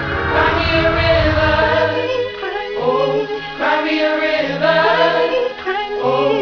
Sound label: cry